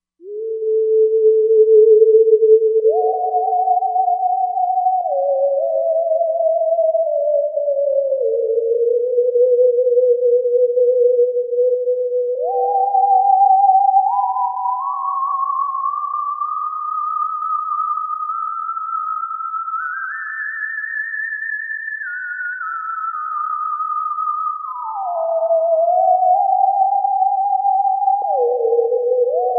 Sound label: Xtrem Sine